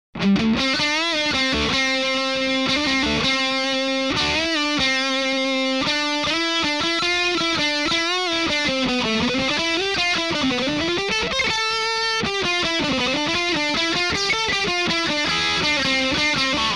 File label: Horizon lead Impro, LTD M1000 Improvisation Solo